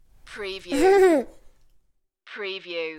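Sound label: Toddler Chuckle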